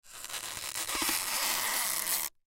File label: Styrofoam Stress, Styrofoam Stress Squeak Creak; Squeaks; Foley; Blastwave